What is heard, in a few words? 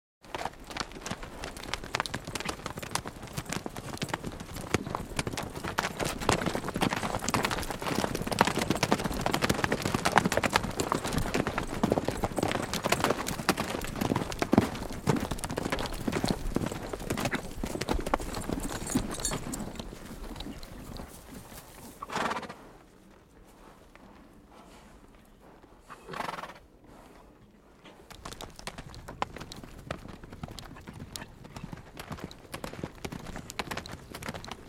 Horses Two Gallop Ste